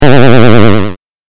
8bit 下降

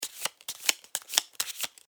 カードシャッフル

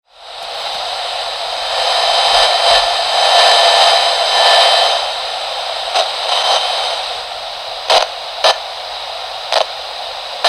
通信不良

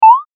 短い音-プイッ

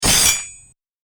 斬撃

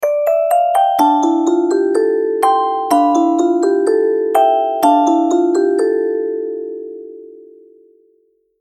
ミステリー オルゴール

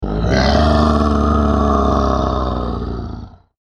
クリーチャー ステレオ

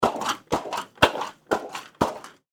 水ヨーヨー

